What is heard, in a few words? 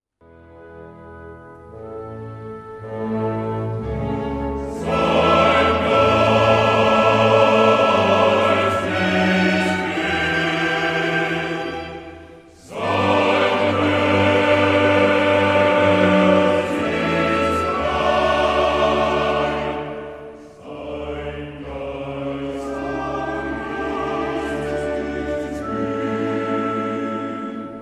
flute; Pretres